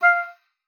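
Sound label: oboe d'amore F5; Xsample VOL-7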